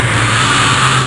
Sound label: gurp; quake2; bug